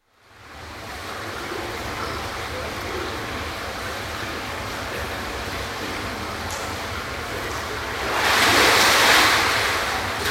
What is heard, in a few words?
Thermal baths, St Margarets Island, with splashes, some indistinct speech, hum from heater; Hungary; Thermal Hotel; Water Sound Effects; BBC